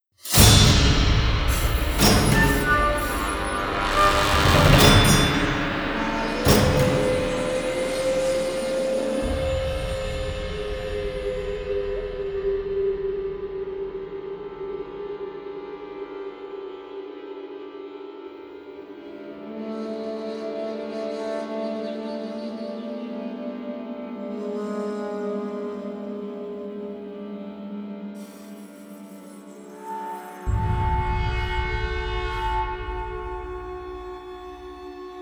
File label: Hurricane; Operation Filmmaker